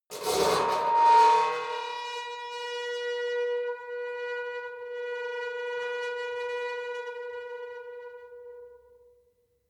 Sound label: metal squeal saturated intense; industrie wirtschaft